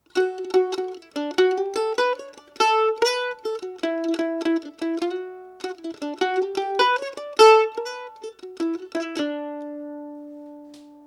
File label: mic placement